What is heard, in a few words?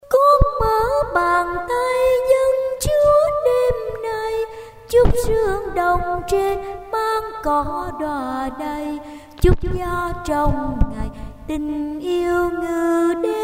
basso